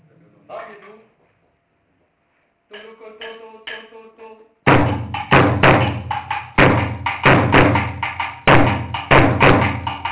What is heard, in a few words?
Tiriba Dunun